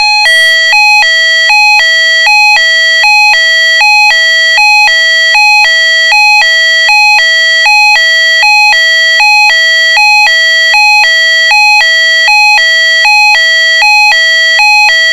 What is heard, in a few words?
special warning